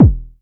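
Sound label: Club bass drum, Jomox Airbase99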